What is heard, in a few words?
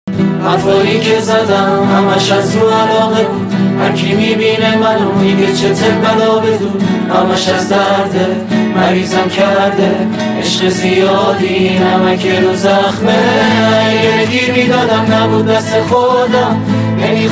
Guitar